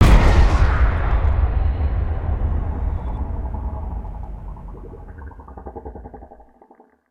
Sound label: Rocket Impact; ambience